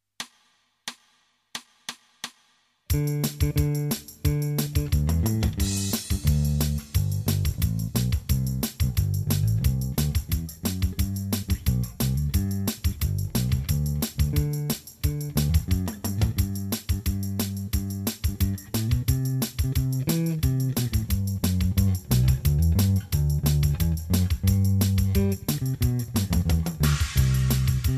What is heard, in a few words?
Country Pickin Backing